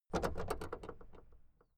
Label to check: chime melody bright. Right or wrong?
Wrong